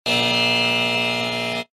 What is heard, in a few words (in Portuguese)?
campainha